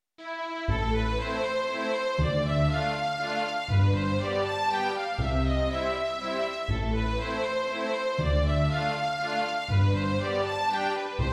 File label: waltz minor